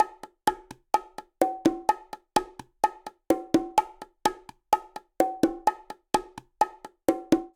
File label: Cha-cha-cha Bongo-127bpm; Latin Percussion; Ableton; Factory Packs